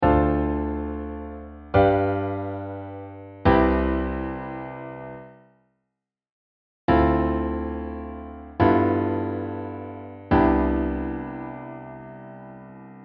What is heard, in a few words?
ii V simple, saxophone